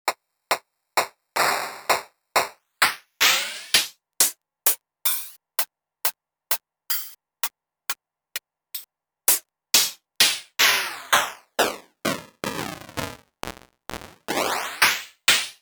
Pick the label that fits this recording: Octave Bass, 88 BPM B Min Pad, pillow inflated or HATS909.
HATS909